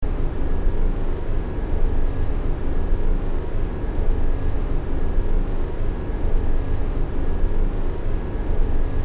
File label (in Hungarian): atmoszfera